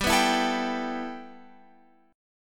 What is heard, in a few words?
G 6th Add 9th, Guitar, Open F; G6add9 strummed; x,14,11,11,12,14